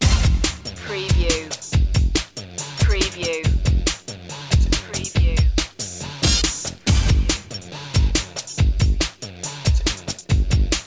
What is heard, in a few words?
workout loop